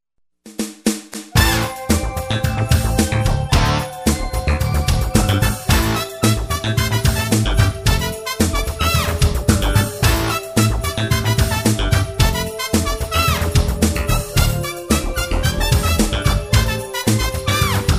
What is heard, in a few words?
God Rest Funk